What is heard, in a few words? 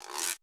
GUILO, SY99; Crypton - DrumBase5000